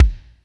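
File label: kick Amb Trnic, Alesis D4; 300 Drum Machines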